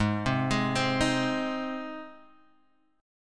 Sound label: G sharp Major, Guitar Standard; arpeggiated; 4,3,6,5,4,x; JGuitar